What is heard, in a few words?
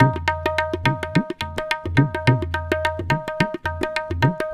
Rahiz, Percussion; Sonic Foundry (Sony Creative Software); World Pop